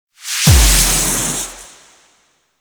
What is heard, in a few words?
Life Impact; Warframe; Dante